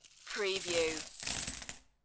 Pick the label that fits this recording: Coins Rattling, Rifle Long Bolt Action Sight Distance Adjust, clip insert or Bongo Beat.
Coins Rattling